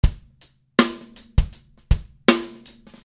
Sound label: dance 80; Drumloops